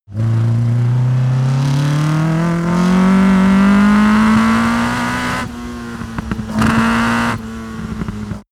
car opel kadett long fast acceleration short decelerations